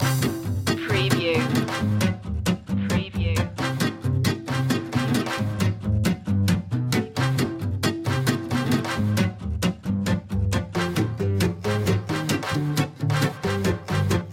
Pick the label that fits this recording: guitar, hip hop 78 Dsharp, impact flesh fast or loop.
loop